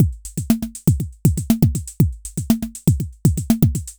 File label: Anabeat Mix, Beats